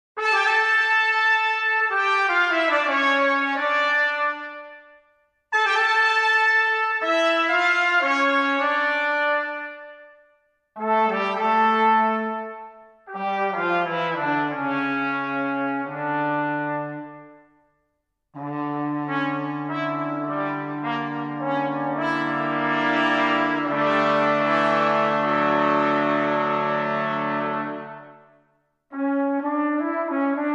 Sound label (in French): toccata et fugue en re mineur, trombamania